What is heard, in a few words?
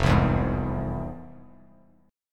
F Minor Major 7th, FmM7 chord; FmM7 strummed; JGuitar